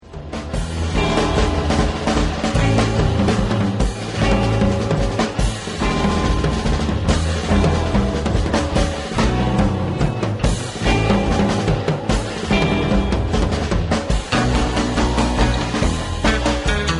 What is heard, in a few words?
Drum Riot